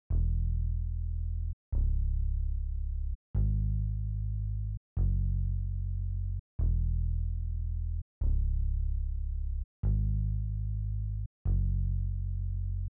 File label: Bass, 148 BPM G# Min; Stems; Cymatics; Good Vibes